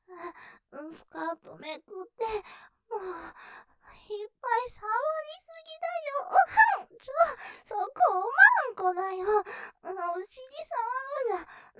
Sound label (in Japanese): 喘ぎ; そこ！おまんこだよぉ；；感じちゃう; ループで楽しむ！シェフの気まぐれ抜き抜きコース